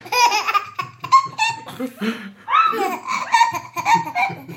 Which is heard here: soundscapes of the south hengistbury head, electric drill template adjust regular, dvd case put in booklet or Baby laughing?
Baby laughing